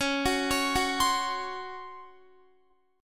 Db Major 7th Suspended 4th, Guitar; arpeggiated

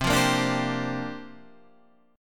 C 9th Suspended 4th C; C9sus4 Chord {8 8 8 7 8 8} strummed

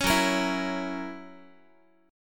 Gb 7th Gb down Guitar, chord; 16,14,13,14,x,x; Listen to Gb7 strummed

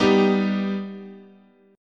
G Augmented, chord

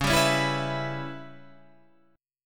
C sharp Minor 7th Flat 5th, Guitar, Standard; strummed; chord; 9,10,11,9,12,12; JGuitar

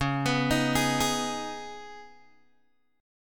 C sharp 7th Suspended 2nd, Guitar, Open G

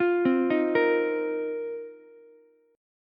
Bb Suspended 2nd Suspended 4th Bb down Ukulele; arpeggiated

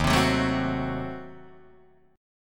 Eb Diminished 7th Eb, Guitar Open D

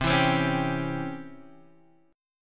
Db Minor Major 7th Flat 5th C

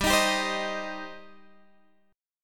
G Suspended 4th Sharp 5th G down Guitar Open G

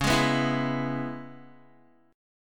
C sharp Minor 7th C sharp Guitar; C#m7 strummed

